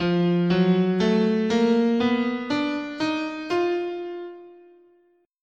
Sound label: F Oriental, scale